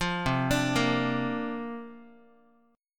C 9th Suspended 4th C down Guitar 4A, 4E, 5A, 5D, 5G sharp, 6C sharp; C9sus4 arpeggiated; JGuitar